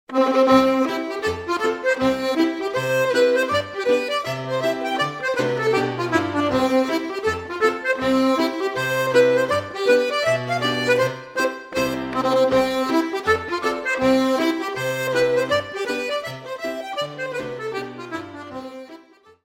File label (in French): Hornpipes - Irlande